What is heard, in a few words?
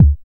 Bassdrum Clean